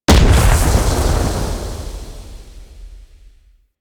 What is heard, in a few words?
Void Arena Ghost Comet Explosion; Warframe; Quests; Wraith Quest